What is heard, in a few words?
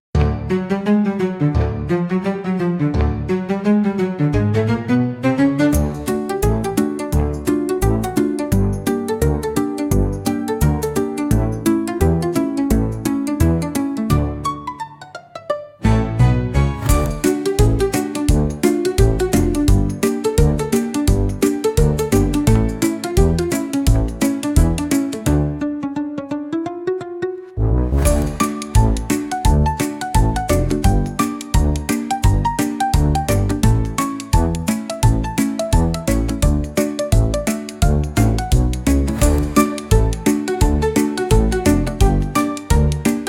Tuba Tumble Parade, comical orchestra for slapstick gag scene